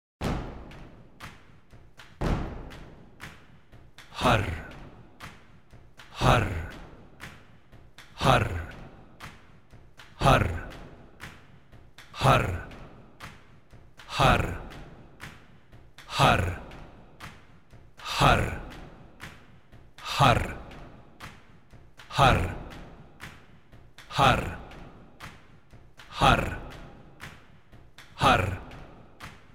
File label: Meditation Har